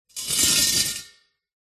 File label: industrial gas canister metal movement scrape on gritty concrete surface